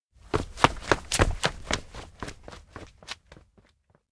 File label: steps indoor town hall run; anemoi パッケージ版 1.1